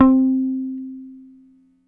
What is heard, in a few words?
HOF SUS, Hofner bass; Sampleheads; Will Lee Bass Library; HOFNER MUTE